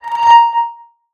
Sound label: alert; android frameworks base; notifications